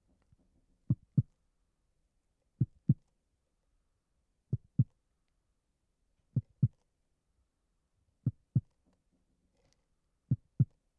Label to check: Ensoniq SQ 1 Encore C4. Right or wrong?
Wrong